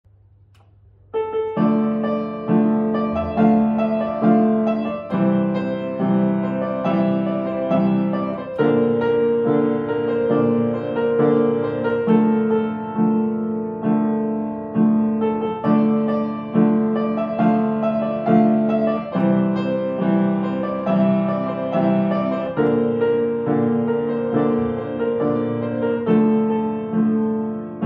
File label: Piano Instrumental